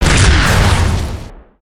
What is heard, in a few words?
Pocket Cannon